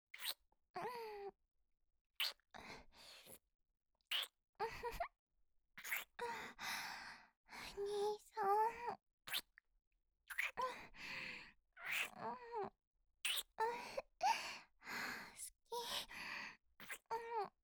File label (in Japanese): 普通キス 好き好き